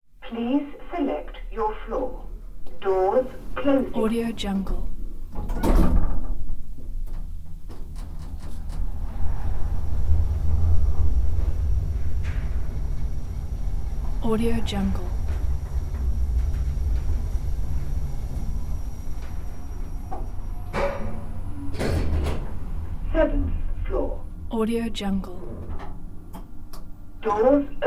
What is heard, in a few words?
elevator sounds